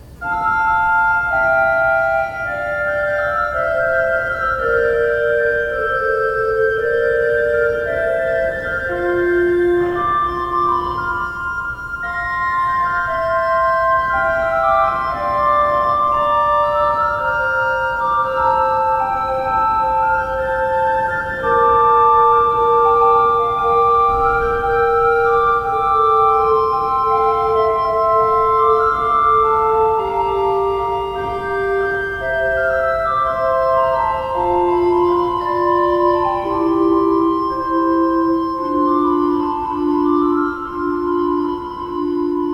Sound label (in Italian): Flauto